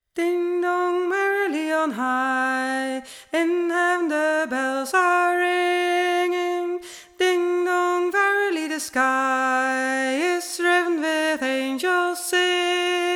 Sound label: Ding-dong ALT